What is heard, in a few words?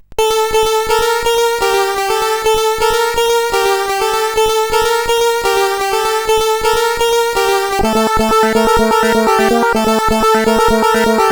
Techno sid CSG8580R5